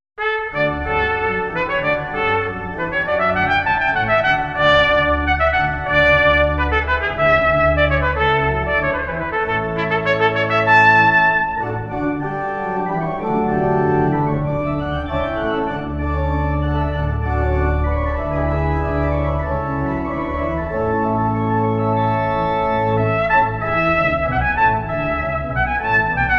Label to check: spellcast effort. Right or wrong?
Wrong